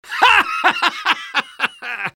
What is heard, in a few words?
laugh happy; soldier